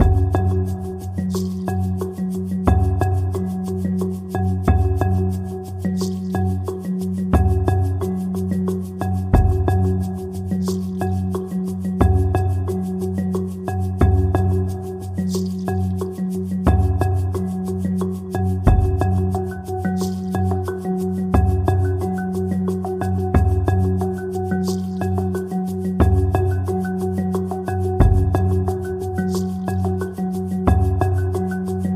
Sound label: No Ocean Drums No High Percussion